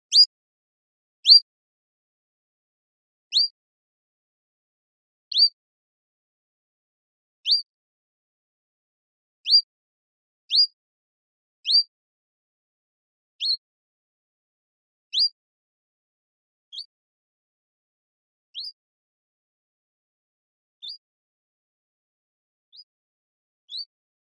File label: Common Chiffchaff Phylloscopus collybita